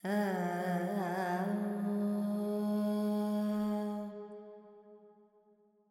Carnatic Female Alaps, Lydian, 70 G, Verb; Sample Banks

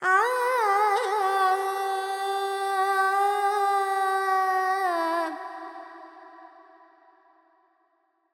Carnatic Female Alaps, Panthuvarali, 100 G, FX; Sample Banks

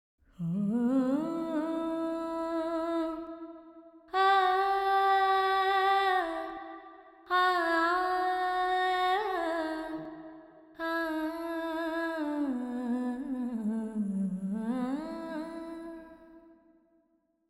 Verb 4-4 Em 70, Vocals Female

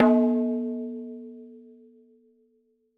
madalam notes L

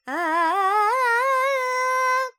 Charukesi, Vocals, Carnatic Female, Alaps, G, Dry

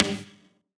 Perc; Sample Banks; Percussion

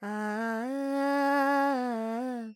Phrygian, Vocals, Carnatic Female, Alaps, Dry; 70 G; Sample Banks

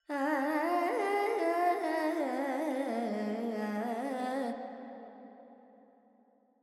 Pentatonic, Vocals Carnatic Female Alaps 70 G; Sample Banks; Verb

